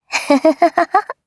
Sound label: laugh, JPN; Eternal Return; Katja